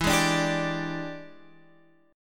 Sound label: E 7th Sharp 9th Guitar Open F, chord; E7#9; strummed